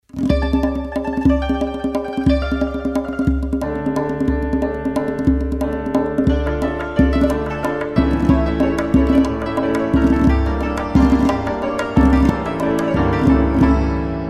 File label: Sound Track